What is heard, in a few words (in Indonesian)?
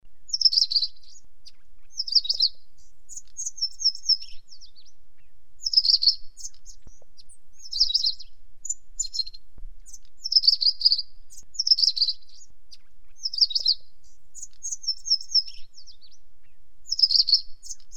suara kacer india